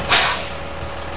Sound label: AIR VALVE, MACHINES